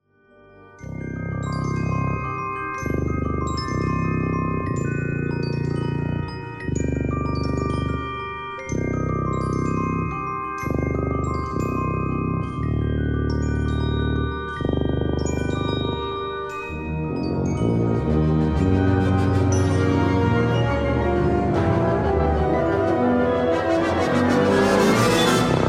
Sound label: concerto for tuba